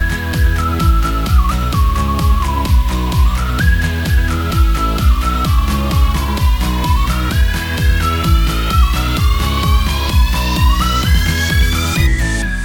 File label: summer house